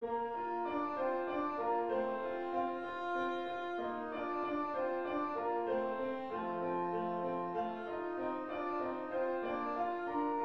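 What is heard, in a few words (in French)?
danse allemande, si bémol majeur; haydn joseph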